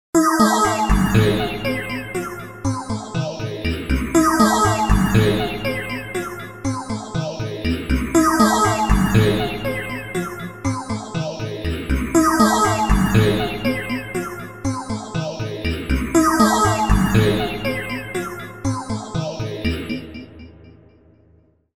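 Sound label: Outer Space Phased Machine, Chromatic hits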